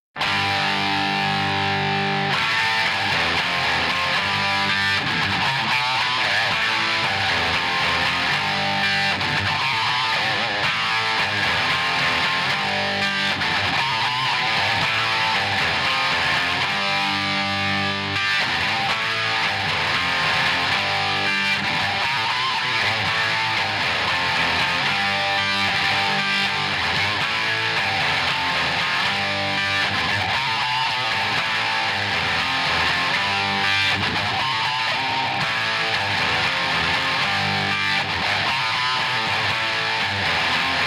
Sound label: guitar